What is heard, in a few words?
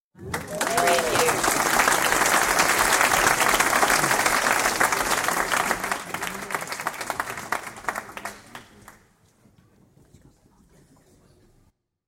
Big Crowd Audience Applause; beanstalk audio